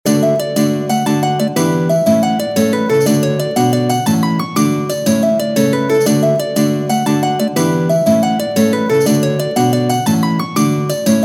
Old English Traditional Dance light and short version